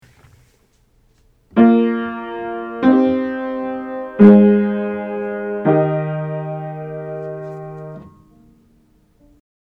Part Writing Root Position Triads Aural Training exercise